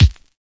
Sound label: kick